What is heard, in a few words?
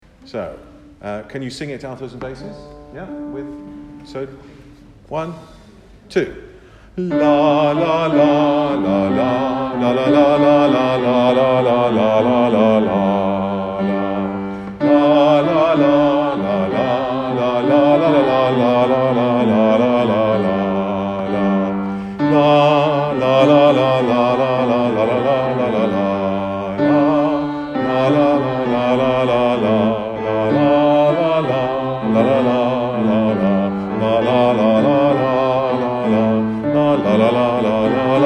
Angelus Alto Bass choir